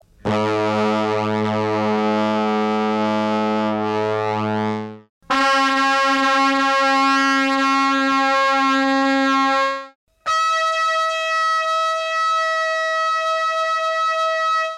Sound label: brass